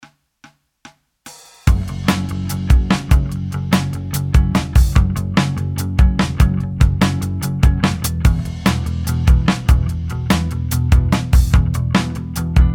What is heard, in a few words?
kraut